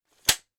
household director style folding chair open or close